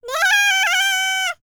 BLACK SEA, World Voices; ILIO; Vocal Planet VOL-5

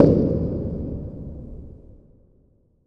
WATER TANK, Impacts, Hits & Booms; Distored Reality 1&2

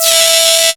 CREEPY E4, sequencer; Best Service Alpha Dance vol.2

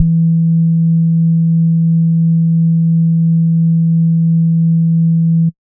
sub bass sine E2; neuro; Stanza

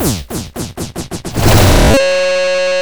SATURFILL, DRM FILL

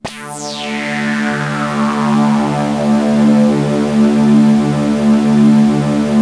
T WAVE, Synth Waves, Techno Trance

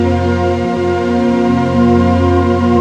FAIRLITE, EMU; Rubber Chicken Software Sample Bank

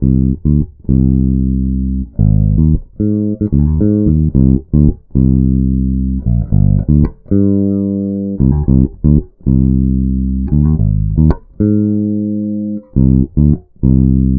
Bass, Electro Lounge; Sonic Foundry (Sony Creative Software); 111 Hotty

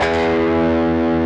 DIST E2, DIST GUIT

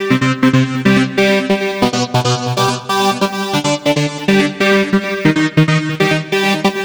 Progressive Synth, Trance; Sonic Foundry (Sony Creative Software); Trance NRG VOL-2; Progressive Synths

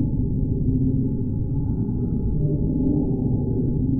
Sample n Hold Verb Loops, sparse soundscape

